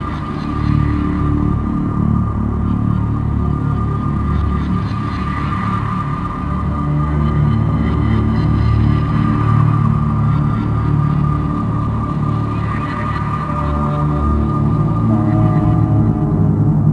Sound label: Ambient; Broom B; jenny leclue detectiv